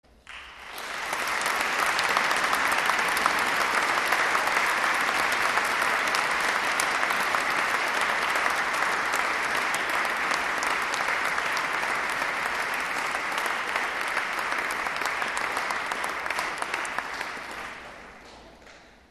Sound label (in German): Applaus fuer Unterstufenchor und Chor; Konzertmitschnitt vom 20. Dezember 2016